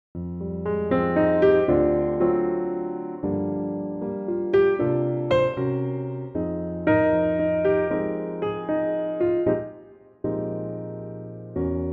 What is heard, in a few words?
Eb piano